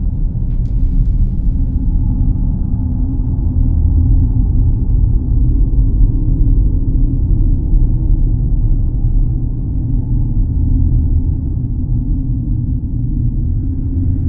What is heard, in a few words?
Ambient Dark Large Room